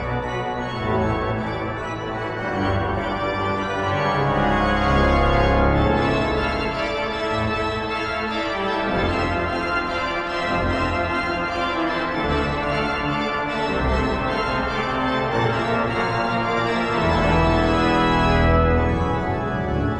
church organ

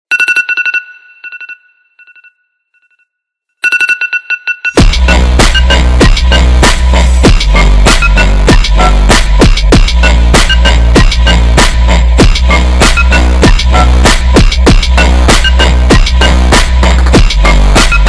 IPHONE ALARM FUNK

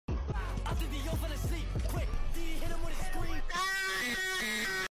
scream; scary

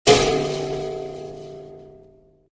coil head spring; Meme

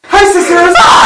sisters scream

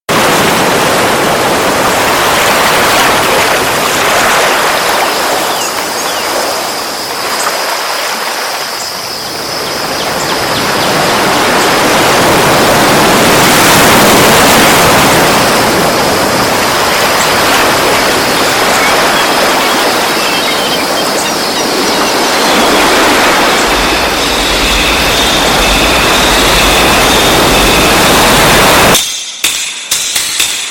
Bass Boosted

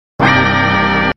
wahhhh

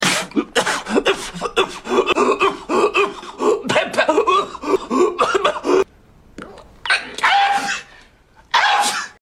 Guy Fake Coughing; Meme

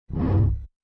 Ending Swoosh; Tik Tok

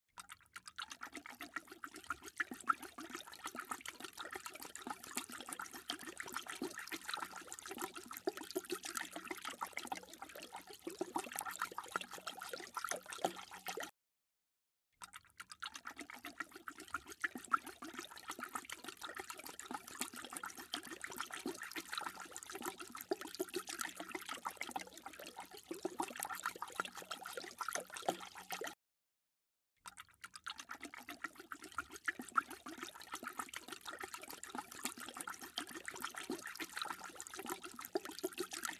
Dog Drinking Water Sound Effect

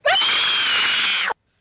Screech

